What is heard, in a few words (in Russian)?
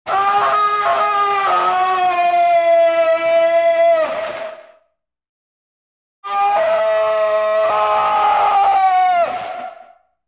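мужской крик эффект искажения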